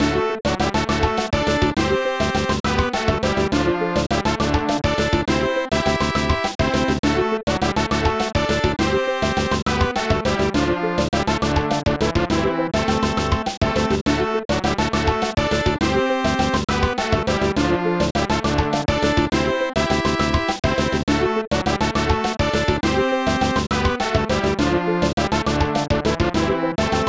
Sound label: Victory!; Yume Maboroshi no Gotoku; SNES